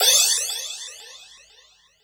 big crash sample A key, crash cymbal sound sample tuned to the A note; tuned cymbal samples